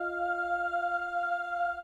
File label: choir; fluoress